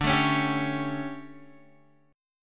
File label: D Major 7th Suspended 4th, Guitar, Open F; DM7sus4 strummed